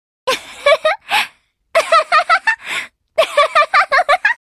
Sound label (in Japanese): エモーション（笑う）「（笑い声）」